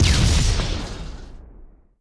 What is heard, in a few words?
neon blast explosion; Tanks